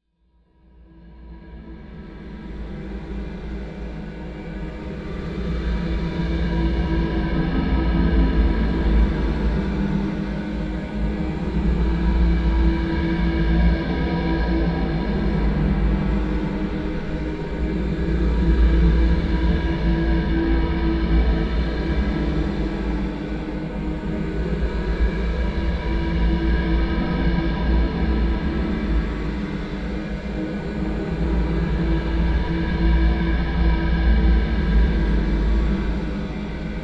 science fiction atmosphere space

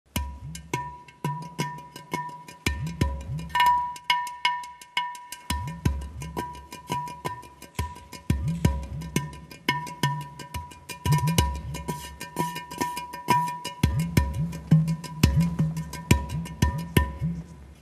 kim kim; کوزه

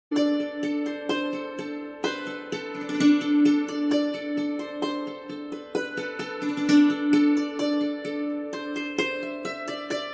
Hammered dulcimer

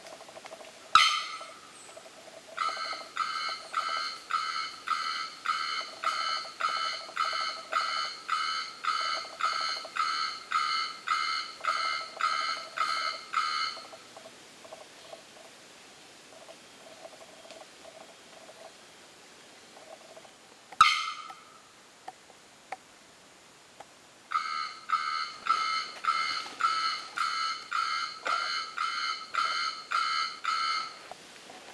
bearded bellbird

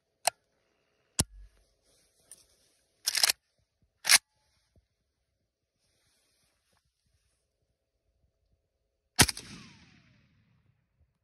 ak 47; اسلحه

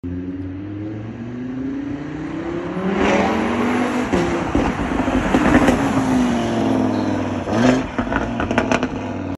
Audi exhaust

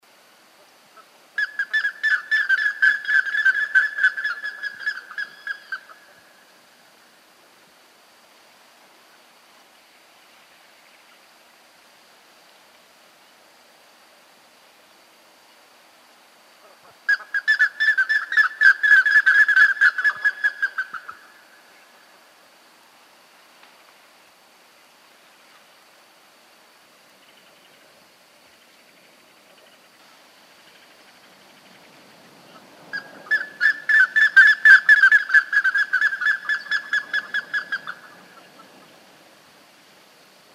Plumbeous ibis; پرنده اکراس سربی

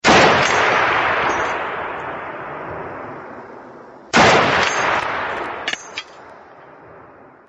Sniper gun shot; Pubg

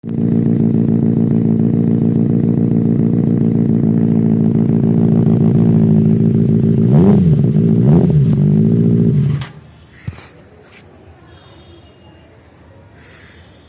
Benelli exhaust

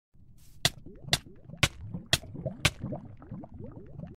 magma

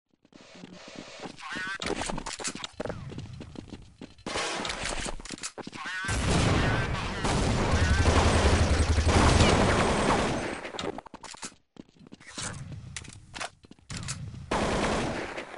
Gun; بازی کانتر استرا

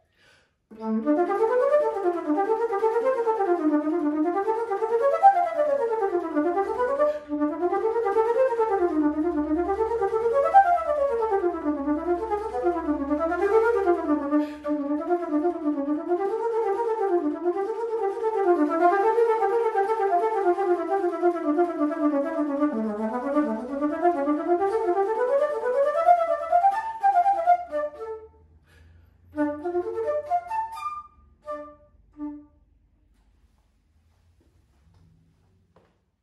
Alto flute; فلوت آلتو

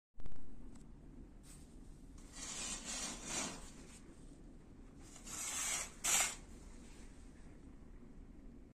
Curtain, کشیدن پرده

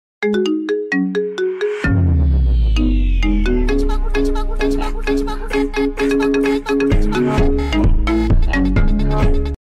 Football ringtone

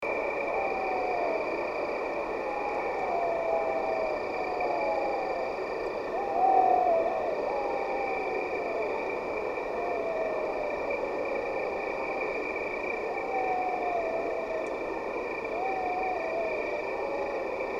Fea's petrel

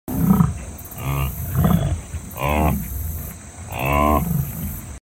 Domestic water buffalo

